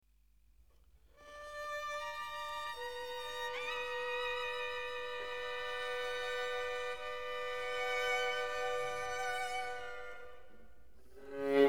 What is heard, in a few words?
string quartet fragment; 2 vl, vla, vc